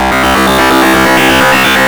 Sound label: GRUNGE